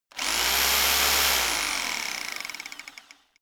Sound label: rock drill chisel burst short; schrauben drehen hydraulik